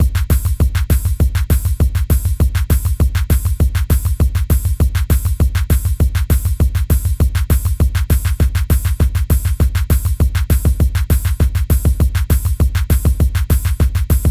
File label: drums end